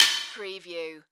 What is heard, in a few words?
Multimedia Percussion